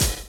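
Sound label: drum chop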